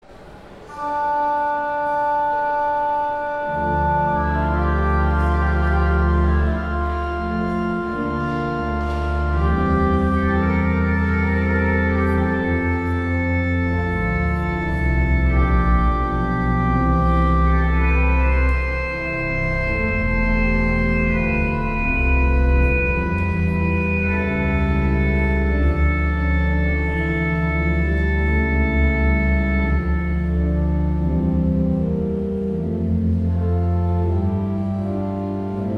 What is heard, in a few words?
Organ, Chorale Prelude; Dietrich Buxtehude; 'When Jesus Went To Jordan's Stream'